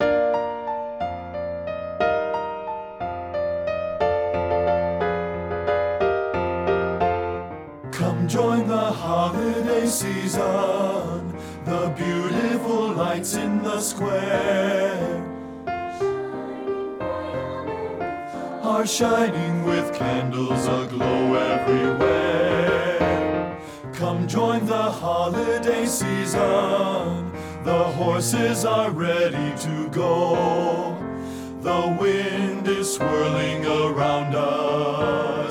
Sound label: BASS; satb